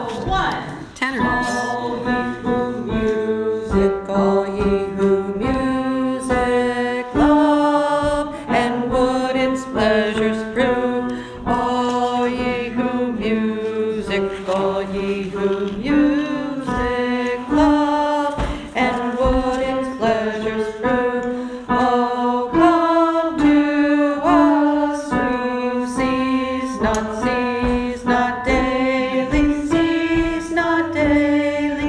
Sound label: tenors; all ye who music love